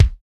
kicks

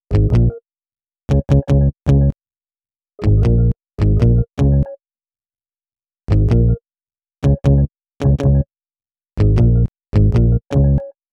Bass, Hip-Hop

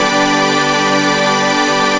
Stab

